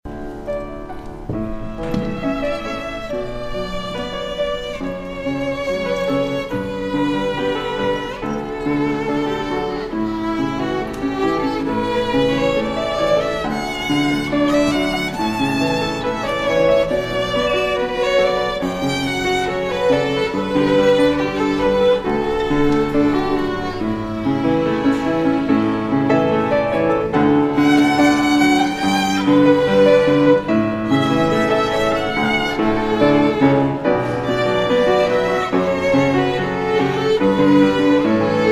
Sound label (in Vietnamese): Hoa Tau Piano Violin